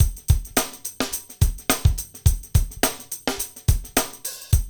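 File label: hip hop, Roland drum beats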